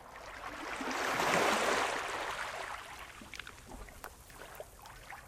Tide waves; friday the 13th killer puzzle

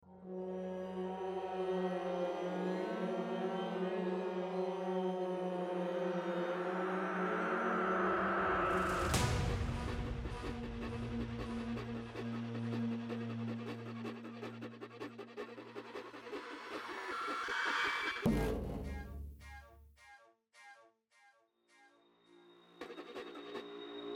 Big Robot Fight Sneak; Horizon Zero Dawn

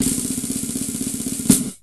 Drum Roll; max ruby toy parade online gamerip 2007

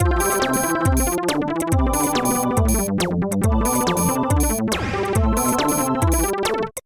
Electro; Moonlight Tower C

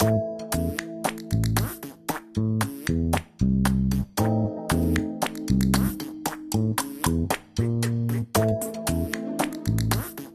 Music Loop; Minimapa; Deadlings

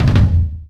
Drum Tom Low; The Princess and the Frog; wii windows; Hub Mansion; Twitch Drums

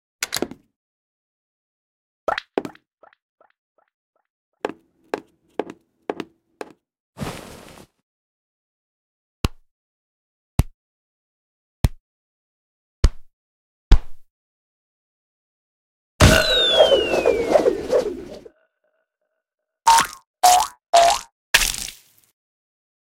Stage Clear

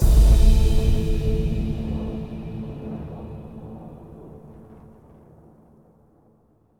Combo

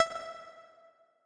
Tessellation Move C

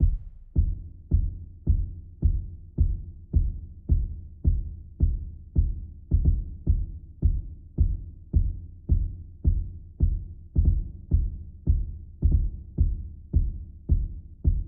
KICK

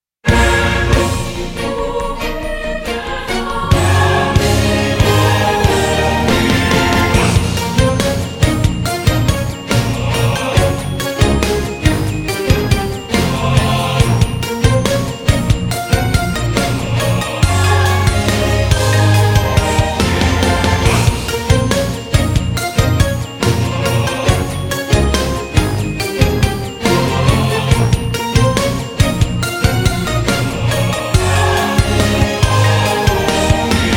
Menu Start

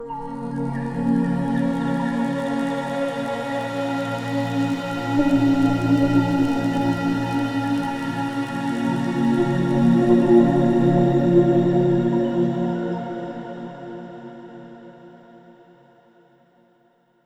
Drone

